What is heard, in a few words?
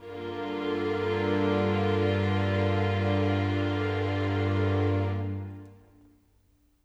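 Pad G minor 7, Orchestral; Sonic Foundry (Sony Creative Software); Orchestral VOL-2; Pads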